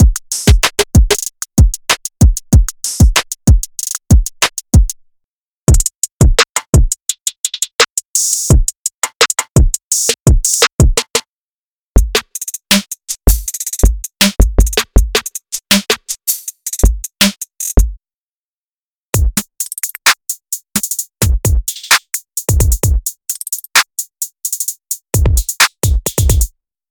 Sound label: Hip Hop Rap